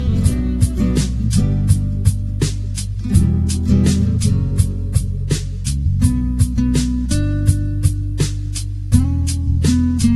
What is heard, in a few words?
Flamenco Chill